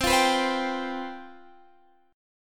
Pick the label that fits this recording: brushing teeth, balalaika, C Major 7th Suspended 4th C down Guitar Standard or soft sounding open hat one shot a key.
C Major 7th Suspended 4th C down Guitar Standard